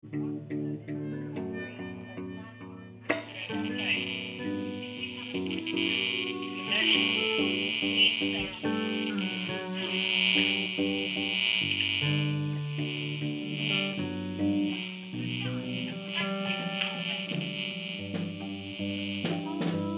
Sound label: Orchestra Bass Tune-Up